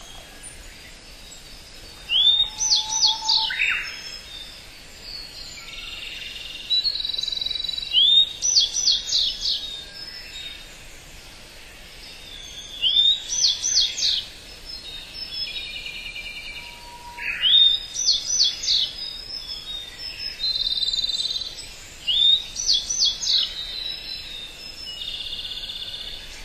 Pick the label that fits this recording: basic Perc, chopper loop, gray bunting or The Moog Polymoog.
gray bunting